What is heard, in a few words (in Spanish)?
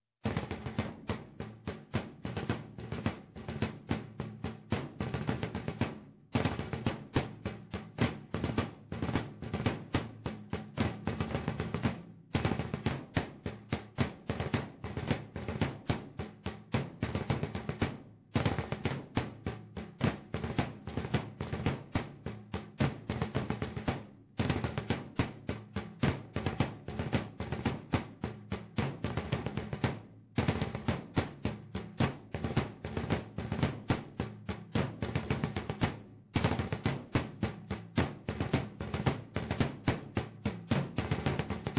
jota, tambores; La jota 2; SAMPER